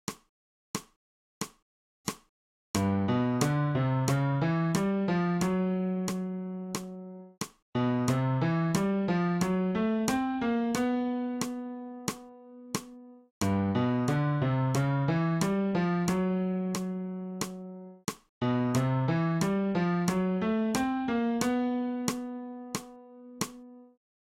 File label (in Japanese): 新フレットレス